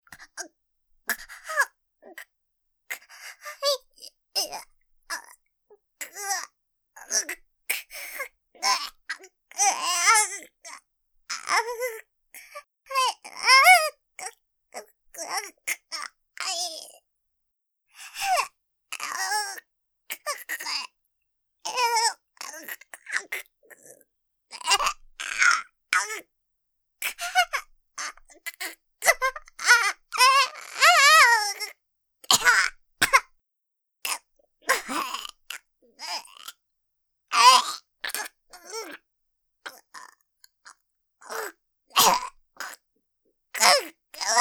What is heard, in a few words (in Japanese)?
首絞め音声; べ、別に首絞めなんて; 首絞め音声のみver